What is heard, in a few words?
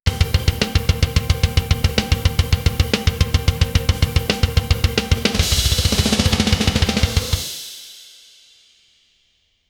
drum solo rock drum solo; suspense